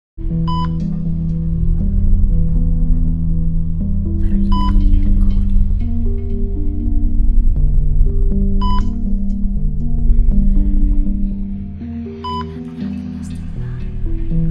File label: horror